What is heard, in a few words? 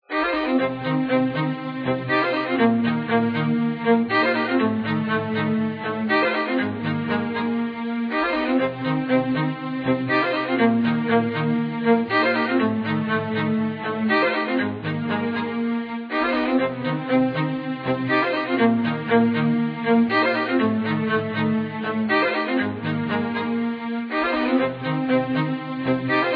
okarina